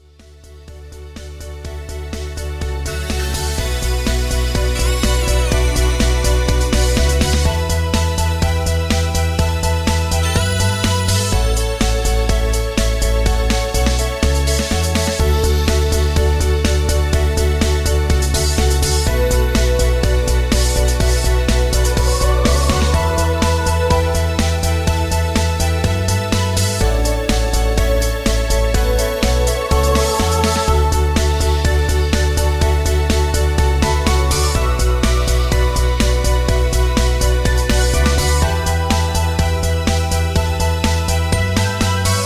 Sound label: Instrumentals No Leads